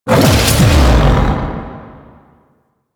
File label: Howl Cast; Warframe; Warframes; Voruna